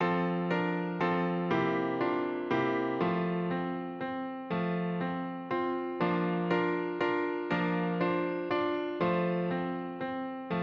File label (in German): wiegenlied